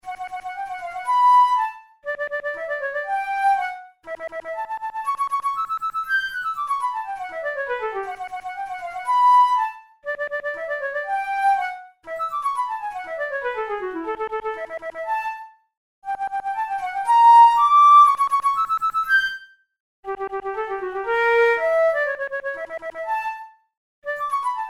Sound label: studies for the boehm flute; drouet 72